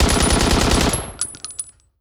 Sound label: infantry fire mg standing; Hearts of Iron IV Death or Dishonor